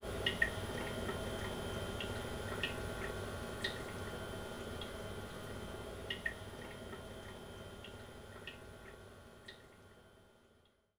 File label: Ambient Main; Afterzoom; DSiWare; NDS